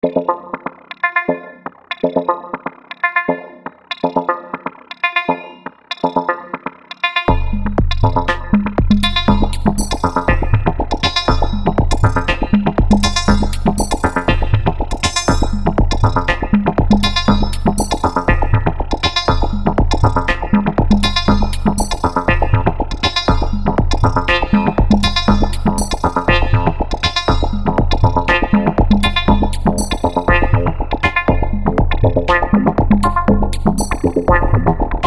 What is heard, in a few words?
Three Sequencers